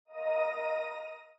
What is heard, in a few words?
shine; zara